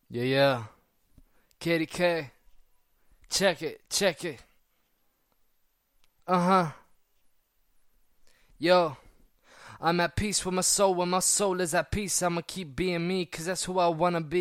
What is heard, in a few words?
130BPM Key Gm, acapella